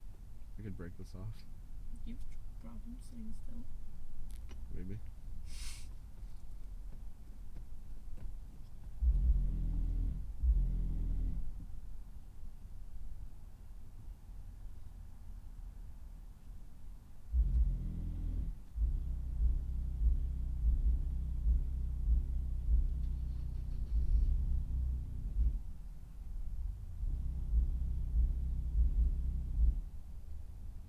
Car With Strong Bass And Sitting In Quiet Car At A Que